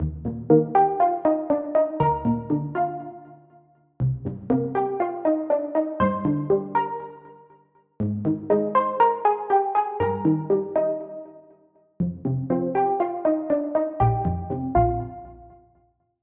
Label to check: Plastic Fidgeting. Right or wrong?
Wrong